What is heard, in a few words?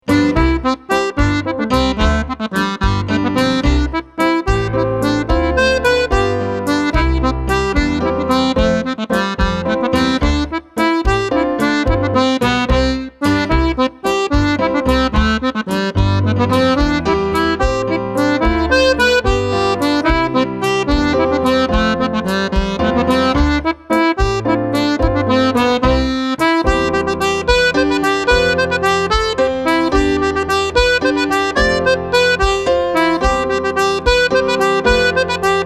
Treble Jig; Irish Dance